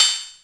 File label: rs7000 hh cy db4, Yamaha RS7000 Cymbals; drum machines